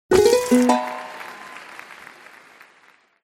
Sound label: win board